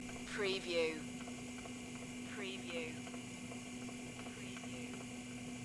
Cassette player hum; beanstalk audio

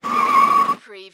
Car or motorcycle brake, tyre screech

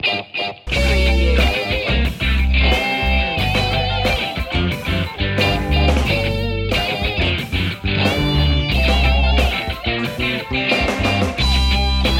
happy birthday funk

